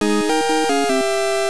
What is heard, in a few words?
roulette victoire